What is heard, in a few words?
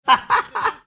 cackling